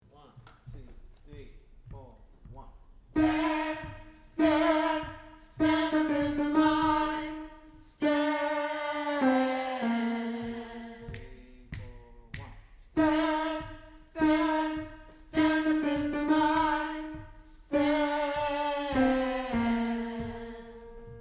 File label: Tenor part